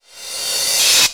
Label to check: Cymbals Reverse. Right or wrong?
Right